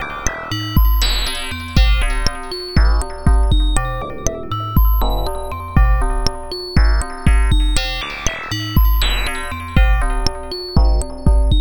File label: Combination Rhythm; Sonic Foundry (Sony Creative Software); Twine-IDM; Rhythms; Combinations